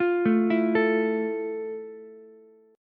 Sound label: F Major 7th F, Ukulele; arpeggiated; JGuitar